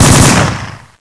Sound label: m16 shot